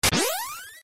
jump dragon boots; ff3us

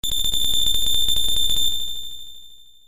phoenix down; ff3us

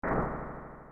door distant; ff3us

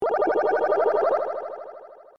rasp; sfx; ff3; ff3us

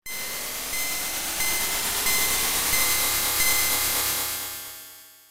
tempest like; ff3us

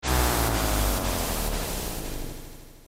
rasp; ff3us

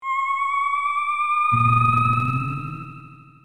fire; elf; ff3; ff3us

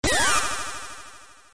zap; ff3; ff3us

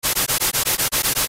boomerang; ff3; ff3us

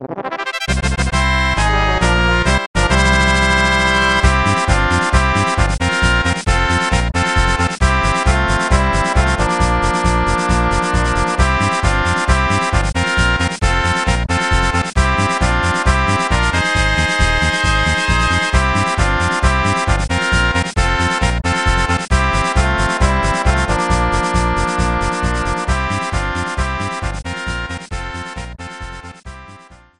victory fanfare; ff3

